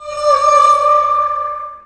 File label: Magic